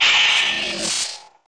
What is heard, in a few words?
WAR DIE, Mob; HOBOSWORD